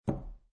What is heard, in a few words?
Poker Chips One Chip Dropping On Felt; Gambling Sound Effects; Casinos